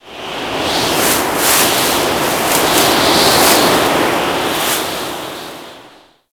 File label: Ocean Rain Gust; Warframe; Grineer Ocean